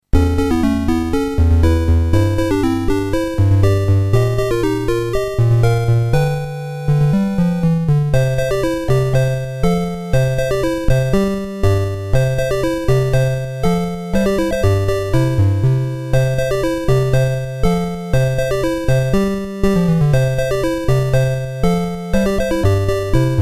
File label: fanfare; ultima 5 music